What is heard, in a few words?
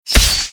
impact blade